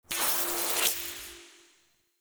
Health Restore, Heal Stingers; Player; Envoy

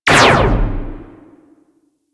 Generic Laser Fire Distant Space C; Warframe; Ambience; Transitional

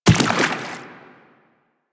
Step Water, Footsteps; Warframe; Teshin

